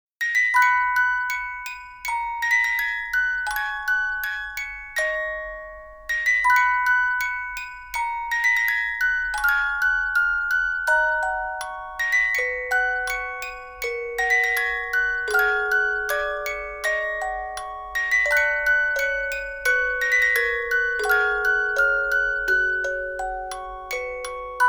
on Musicbox; Atelier Sophie The Alchemist of the Mysterious Book Original Soundtrack

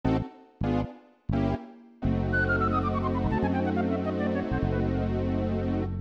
Hang Glider - Crash

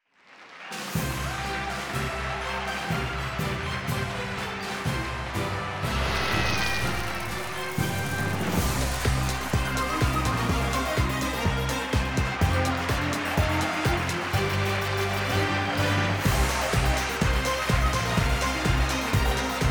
Intro Fanfare; ESP

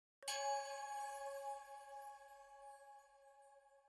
item Jingle Chime Only C sharp

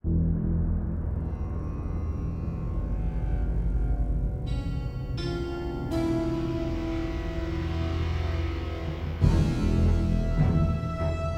String; Assassin's Creed Unity Dead Kings

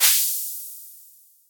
Poison Cloud; boomerang fu

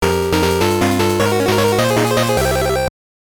Jingle; Fastest Lap; gb

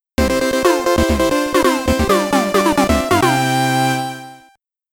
Level Complete; Pang; Buster Bros; PC Engine

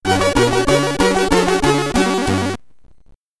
Answer OK SID Stereo; Trivia Ultimate Challenge; Commodore 64

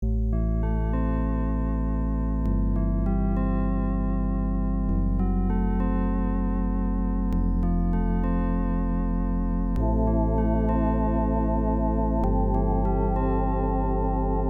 Sadness; rusty ms-dos

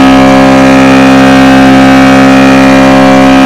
s2000 on high

